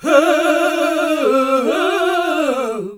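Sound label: Gospel; Best Service Gigapack 1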